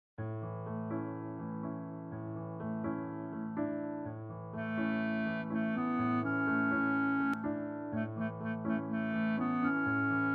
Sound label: song piano with guide; Little Mermaid; Ariel